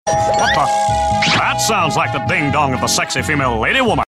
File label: Dingdong